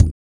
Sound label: Vocal, Blues & Soul; ILIO; Vocal Planet VOL-2